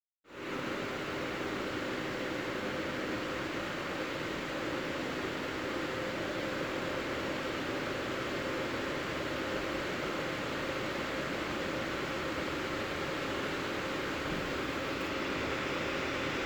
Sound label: Fan